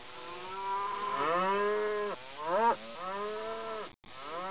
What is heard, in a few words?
cattle; 12000 Graphics Pack - A Complete Graphics Collection For Your PC